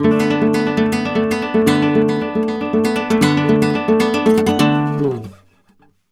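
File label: arpeggio guitar, Flamenco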